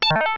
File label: beep; Midway Games; Smash TV